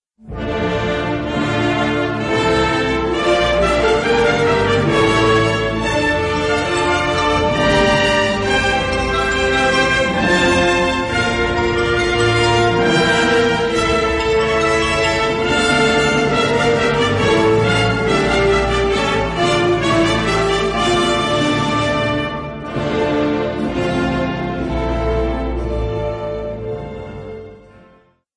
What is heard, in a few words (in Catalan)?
secció corda